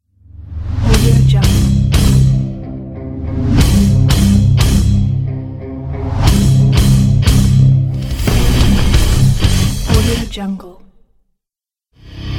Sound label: hard energy metal guitar rock logo